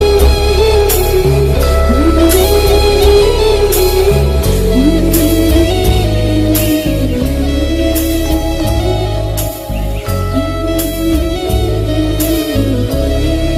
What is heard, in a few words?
Best Classical Music Ringtone